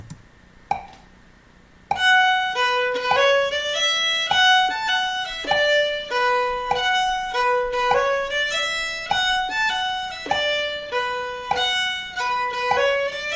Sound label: gavotte